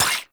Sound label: Pistol Mech; Operators; Drifter